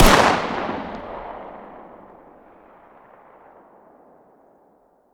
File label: fire dist 9x19 smg ext; gmod; arccw uc commons attachments